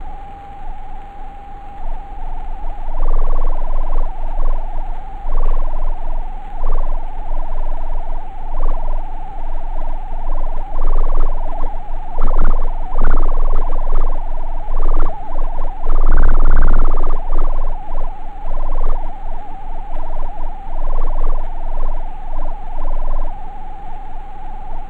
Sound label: Japan M68 Audio VCO, seismometer; diy home seismometer station